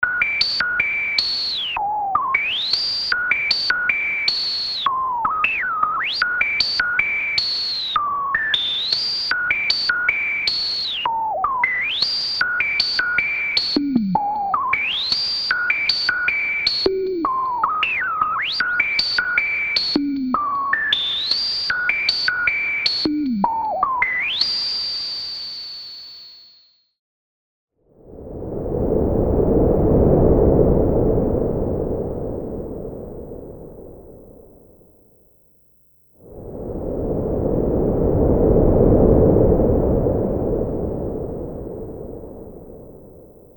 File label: Noise FX, Waldorf Pulse; PULSation